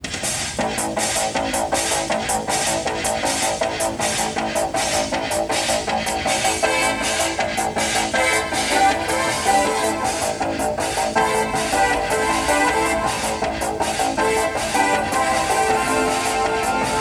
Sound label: Laptop Music; sons of the forest